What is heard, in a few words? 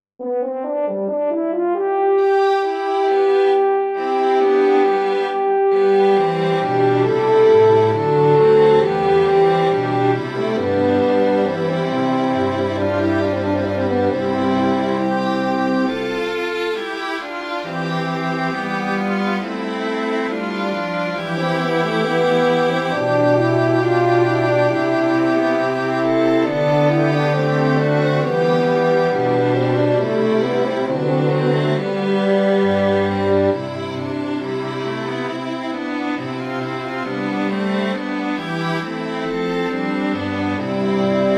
choral prelude for horn strings; buxtehude dieterich; gelobet seist jesu christ